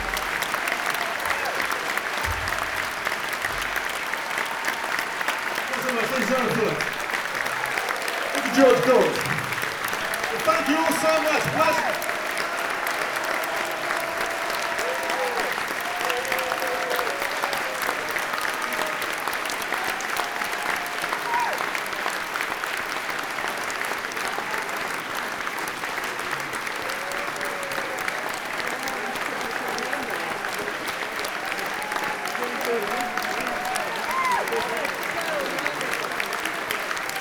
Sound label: applause before encore, talk; cmc-8